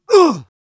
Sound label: minor pain, soviet